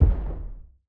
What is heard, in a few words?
stomp; nova sound